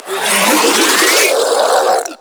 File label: Infested Ancient Melee Mid Spin Scream